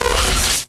lightning attractor charge; Factorio

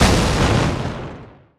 space platform explosion; Factorio

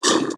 recycler vox; Factorio

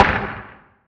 asteroid damage oxide small; Factorio

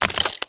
ice particles; factorio

